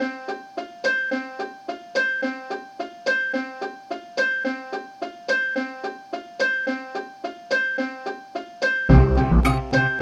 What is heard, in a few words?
piano